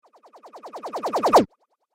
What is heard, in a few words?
Out Of The Magic Tube, magic; Magic Sound Effects